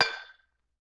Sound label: Xylophone Clank G, Vintage Cartoon, Musical Elements